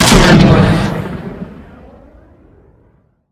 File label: illusion fear explosion